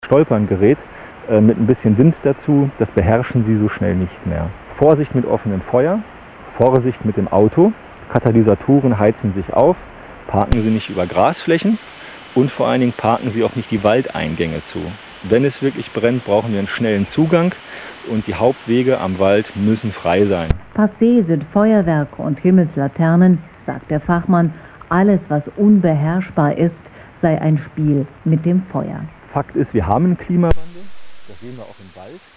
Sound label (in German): Langwelle 153khz